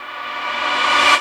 DANCE FX

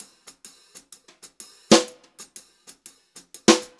Brushed Drum Loops jazzy 126; USB Soundscan vol.39; AKAI; 90 s Sample CDs

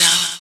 Fx Vox; Equipped Music; Loungin House

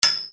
Percussion, EuroDance

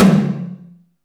HALL HI, TOMS; Back In Time Records; Big Bang

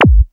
ZAP; Sounds Good - Methods of Mayhem

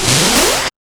SYN-FX

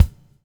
BUBL, BUBBLE; Spectrasonics - Backbeat

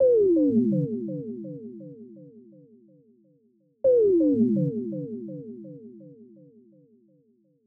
Fx Syn Loop 125; Equipped Music

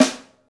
St Snare, Roland BK-5; Snares

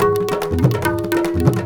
India, Heart of Asia

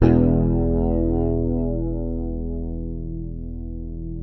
Fingered bass E1 R; Sampleheads; Will Lee Bass Library

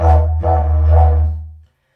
Didgeridoo Kit Samples

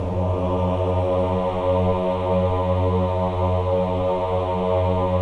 CHO AH, Choir of Ahs; Roland - LA Composer vol. 1

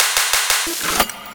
fill 90bpm

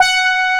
SYNTHESIZER, ANA HORN; AKAI S6000 CD-ROM - Volume 1

